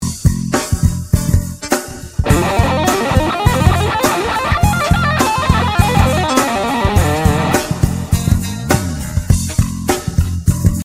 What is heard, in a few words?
Lick, guitar